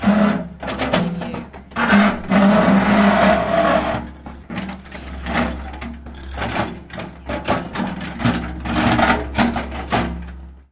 Metal Stress - Rattling, Scraping Metal With Concrete